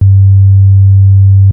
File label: sub bass g2, Chroma Polaris